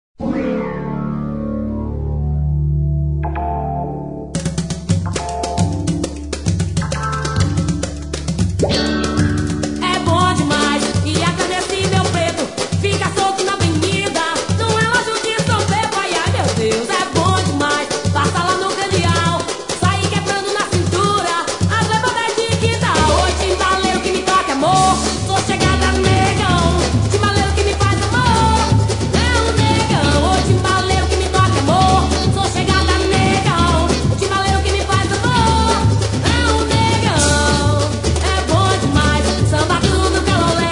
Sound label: Samba Duro